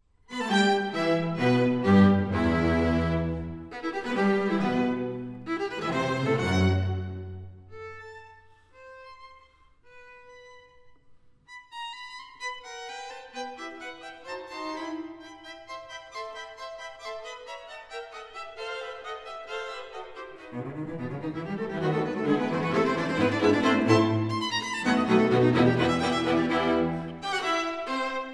quintet; cherubini

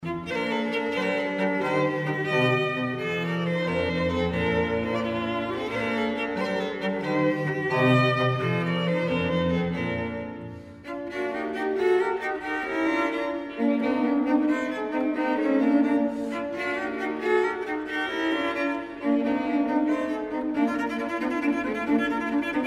trio; reichardt

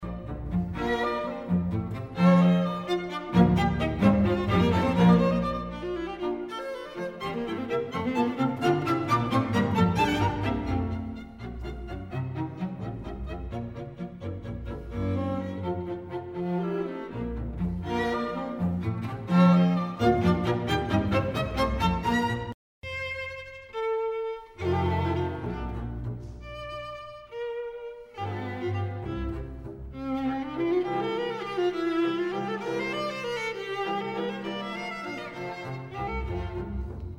quintet; eybler

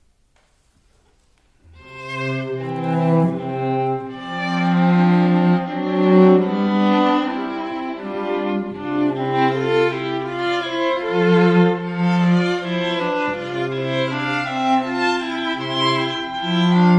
divertimento trio; vanhal

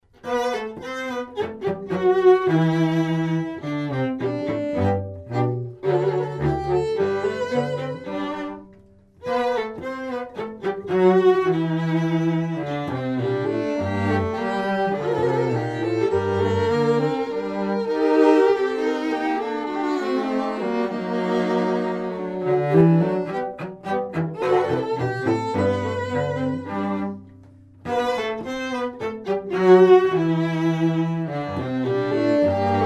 trio gavotte; rontgen

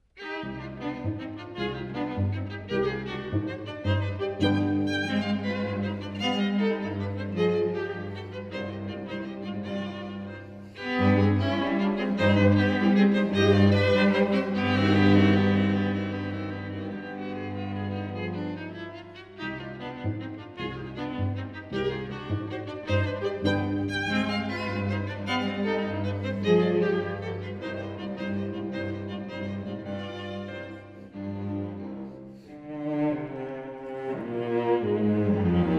string trio; reinecke

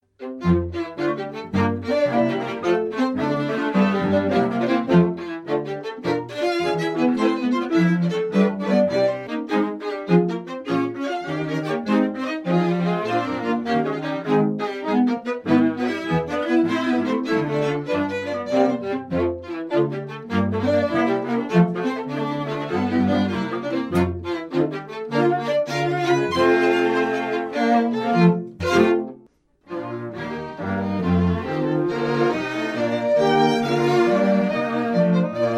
quintet

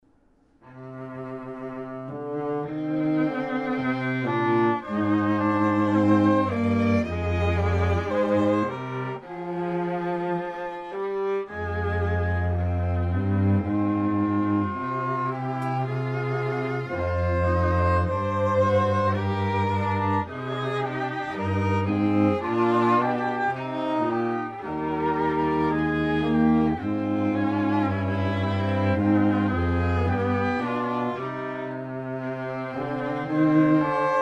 trio

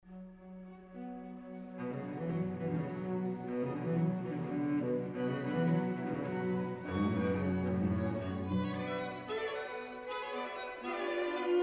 sextet; gliere

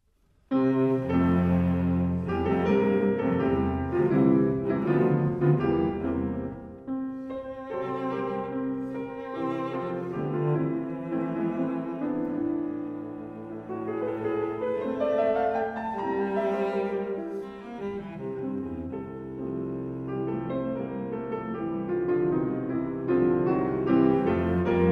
cello sonata; franck

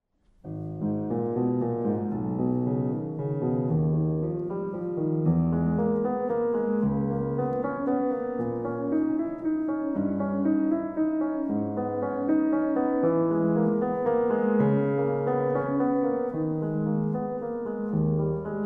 piano qt; ries

